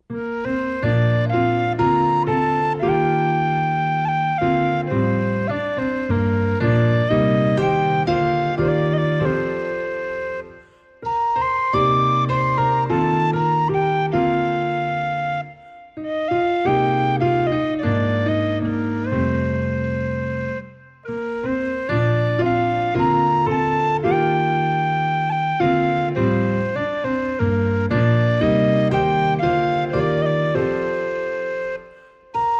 Hymn

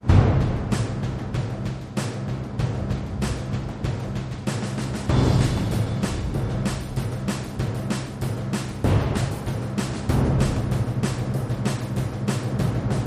Hostile War Drums

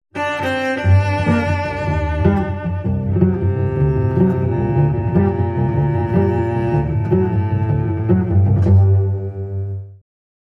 Cello Suite 2 Variation 3

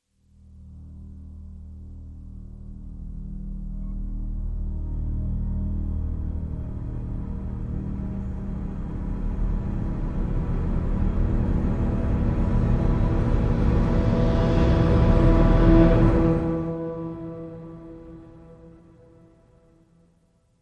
Short Swelling Horror

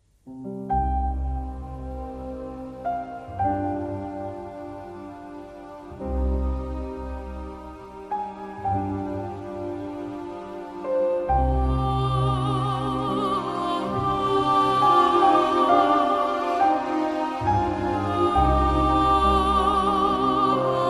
Melody With Soprano Voice

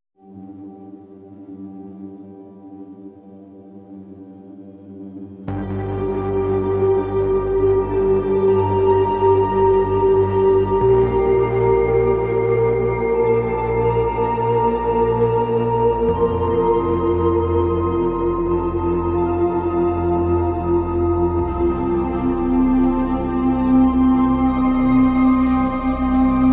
Distant Storms, Underscore

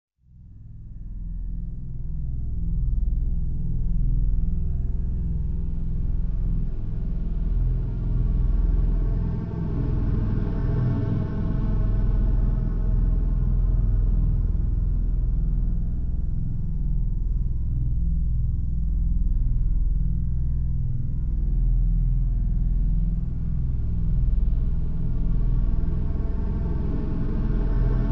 Drones

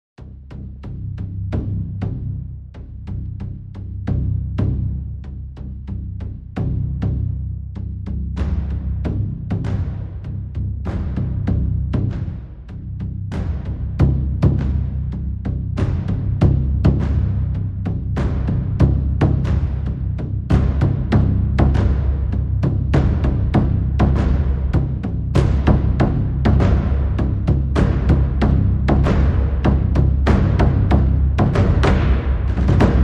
Low Taikos; Hattori Hanzo

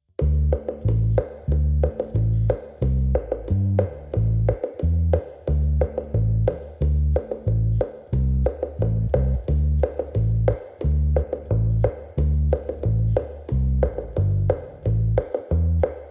Drunken Sailor Bass & Percussion

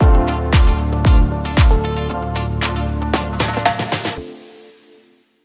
Bumper

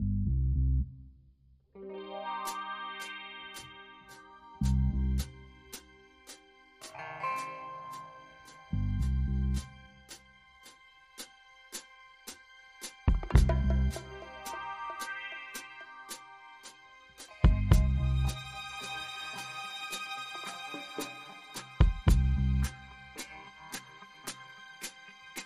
Harbour Gang War, Tension

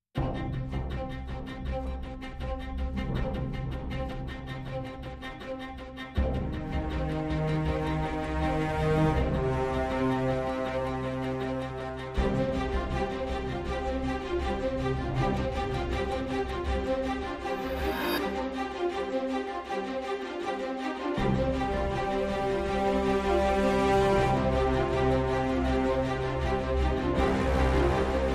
No Perc No Choir

